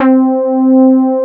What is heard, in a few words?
SB HORN; Wizoo - Powered Nord; STEREO LAYER